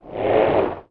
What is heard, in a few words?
science fiction alien creature growl; sound effects one